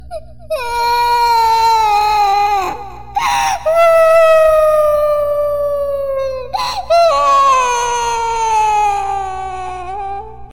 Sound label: cry; StarEscort; qiyu